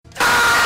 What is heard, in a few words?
scream